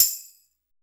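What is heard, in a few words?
ROCK TAMBOURINE; POP-ROCK; Drums In Your FACE!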